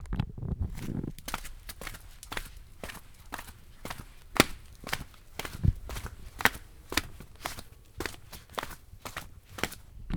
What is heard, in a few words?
footsteps